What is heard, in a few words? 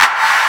clap